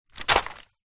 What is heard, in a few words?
mail bills paper small pile drop on wood surface